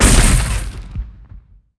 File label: skill explo